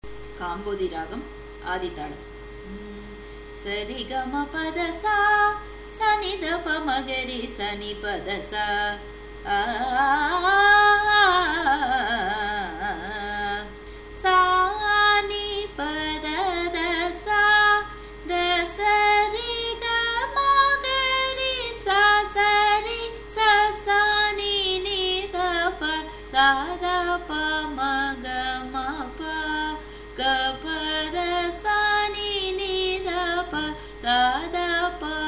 Mandhara Dharare G sharp, music varnams; Carnatic Music; Gsharp, G# (5.5)

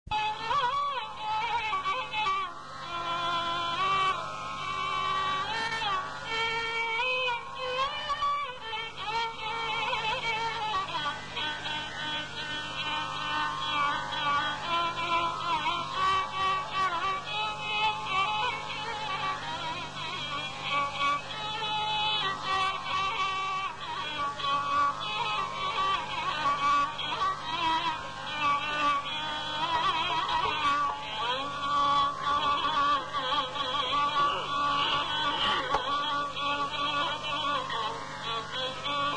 thodi alapana; manodharma

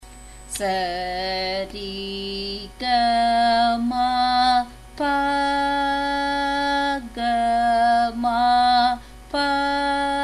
Sarali Varise, F sharp

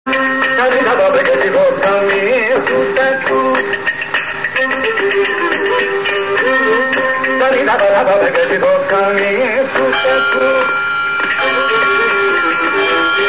bilahari swarakalpana; manodharma; paridhana

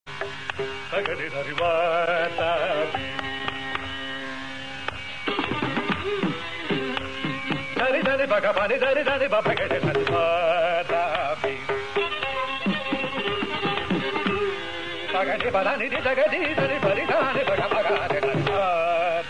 hamsadhwani swarakalpana; manodharma; vatapi

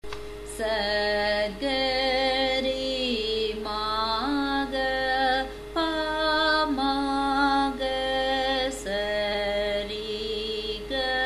Dhatu Varise, G sharp

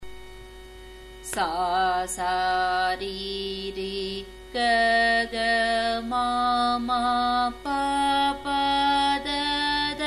Janti Varise, F sharp

